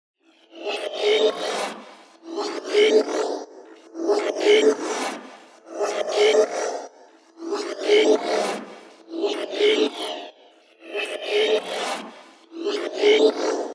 FX Loop 140 BPM; Master; revealed fx loops vol 2

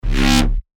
bass shot C#; Frieren; revealed bass shots vol 4

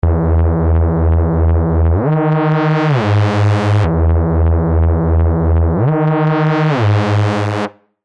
Reese Break, bass; revealed serum 2 bass tech house vol 1

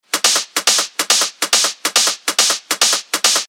techno loop 140 BPM; Locker; revealed techno top loops vol 8

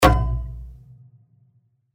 B, percussion; Stronger; revealed percussion vol 2

